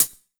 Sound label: soft hit normal